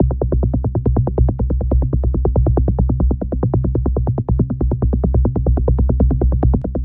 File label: 140BPM C; Vengeance - Essential Clubsounds Vol.4